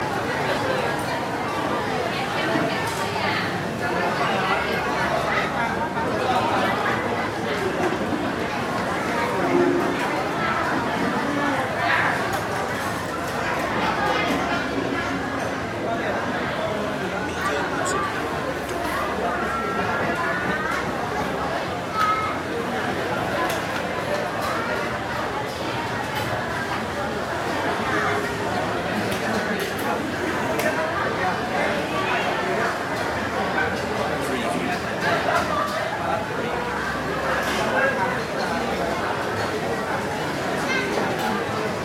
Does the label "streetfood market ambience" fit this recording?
Yes